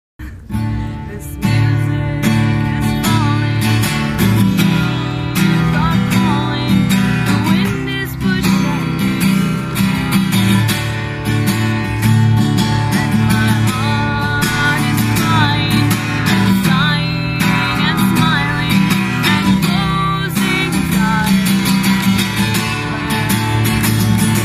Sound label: Fall